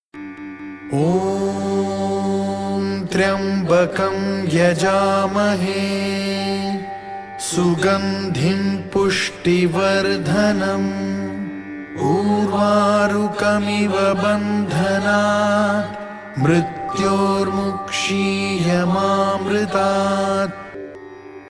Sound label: Maha Mrityunjaya, opening prayer